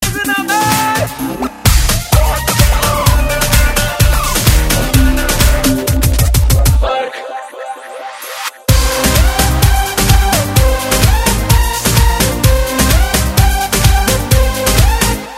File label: Music Ringtone